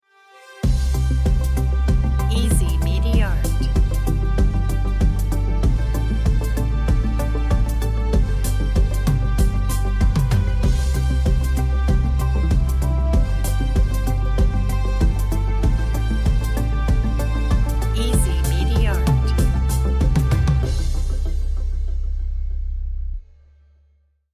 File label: background fiesta logo